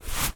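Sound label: foley clothes baseball cap take off head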